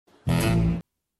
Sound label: Tadan